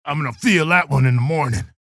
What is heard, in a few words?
pain ability damage; low health; keel